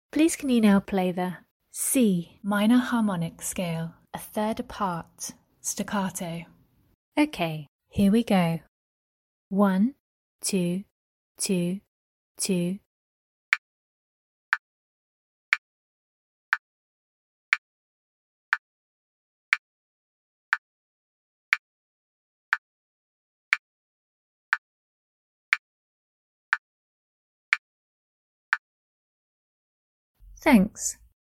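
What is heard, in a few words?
C minor harmonic scale 3rd apart staccato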